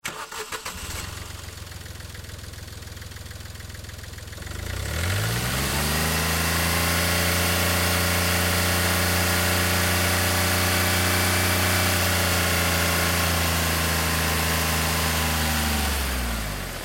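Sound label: Car Smart Car Onboard Revs; 2005 Smartcar Fortwo; Vehicles; Big Room Sound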